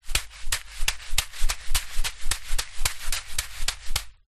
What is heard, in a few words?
leisure juggling bean bag small throw between hands fast; zapsplat